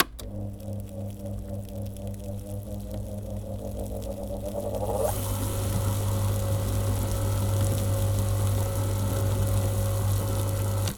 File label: Motor Starting Up Slowly